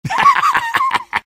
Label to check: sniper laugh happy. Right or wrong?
Right